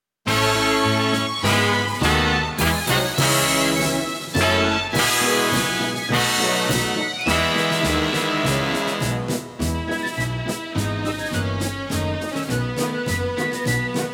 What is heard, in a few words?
(Karaoke Version)